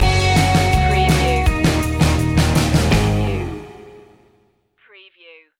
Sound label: breakin waves stinger